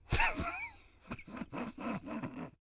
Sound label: SNICKER, LAUGHS